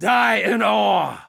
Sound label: Curse Vocal; NPC; Dominus Combat